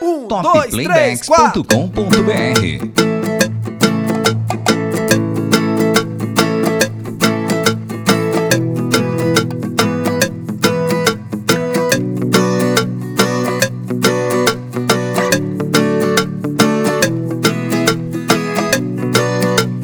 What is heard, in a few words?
reggae